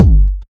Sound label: warm kick drum one shot g key